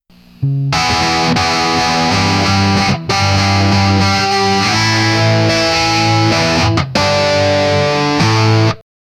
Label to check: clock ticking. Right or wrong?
Wrong